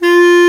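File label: Bass Clar mf F1; Digital Sound Factory Virtuoso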